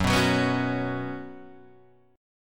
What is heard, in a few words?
F sharp Suspended 4th, Guitar, Half Step Down